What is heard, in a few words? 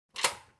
key